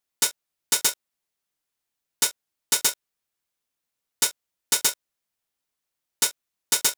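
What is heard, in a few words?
909 ohh, open hi hat; hydrogen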